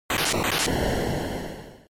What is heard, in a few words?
SNES RPG Magic Lightning3